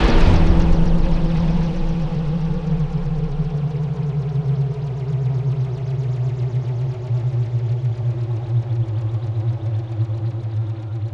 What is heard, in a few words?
STING drydock synth, Cinematic Metal Impacts